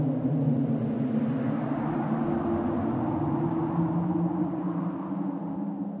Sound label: ambience graveyard; everness